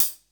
CL HAT, CYMBAL STUFF; drum

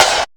SD NOIZ, snare drum; SNAREDRUMS

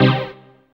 dance chord

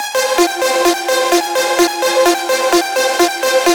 synth 128 Fm; Electro; Anthemic; synth loops

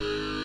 Guitar Note; Benn Jordan (The Flashbulb) - Cassette Cafe

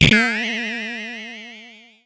ELEC FX; JUST FOR FX

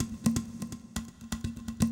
PERCLOOP ETHNO 125

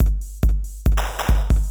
DRUMLOOP TEKNO 140; Techno2

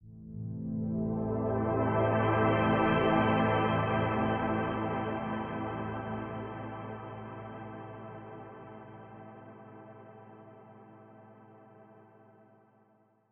techno pad 140; Techno2; Keys

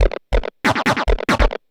Industrial 140

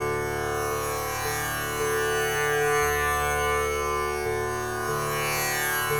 ethno, 160, C

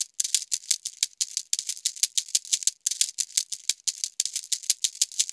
ETHNO PERCUSSION 90; ethno2

